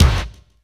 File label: BD, 170 BPM; Ueberschall; Drum & Bass Resonance